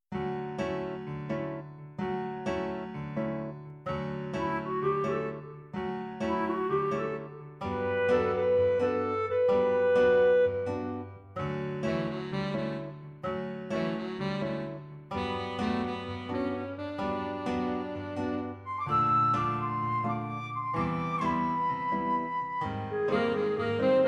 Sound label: bossa minus drums